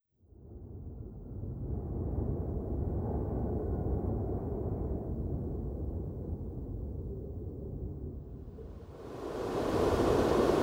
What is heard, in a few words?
Wind measure